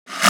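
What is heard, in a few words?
Clap reverse, right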